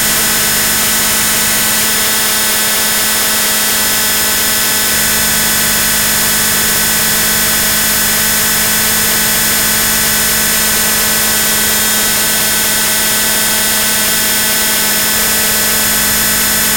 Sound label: GSM non hopping audio example